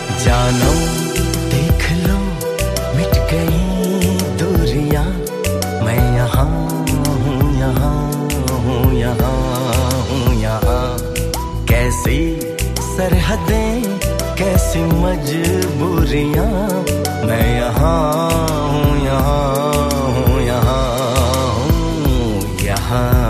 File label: Classical Bollywood Ringtone